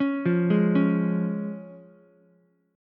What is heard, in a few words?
Db Major, Ukulele; arpeggiated; JGuitar